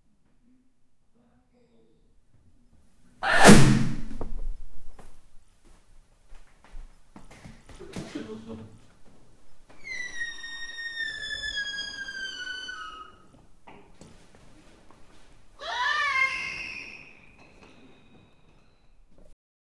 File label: man falling down stairs a